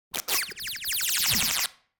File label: Beeps Sci-Fi Space Machine Beep; Hollywood Edge; sound designer's toolkit v2